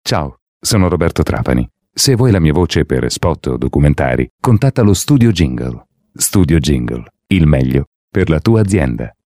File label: jingle; trapani